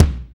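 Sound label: bass drum, Roland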